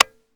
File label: disconnector; weapons; gmod; viper